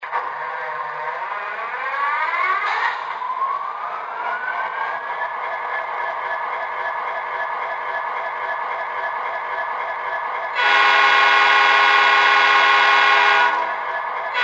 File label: SD60, electric trains